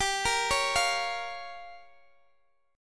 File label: G Minor 7th Flat 5th, Guitar Open G